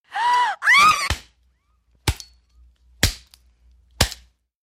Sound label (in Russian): jenshinu ubivaut toporom